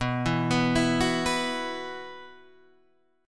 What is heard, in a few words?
B Suspended 4th, Guitar, Half Step Down